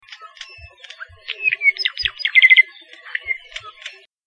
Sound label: apapane call